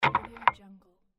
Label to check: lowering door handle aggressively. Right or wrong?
Right